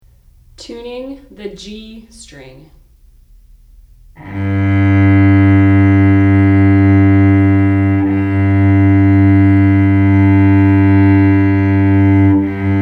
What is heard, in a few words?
Tuning G String, cello; Tuning the G String